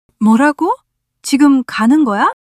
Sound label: surprise